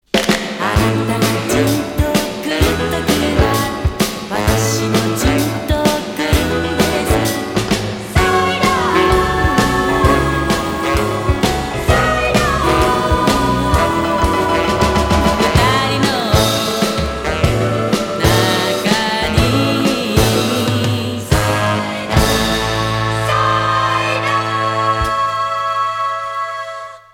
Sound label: FEMALE VOCAL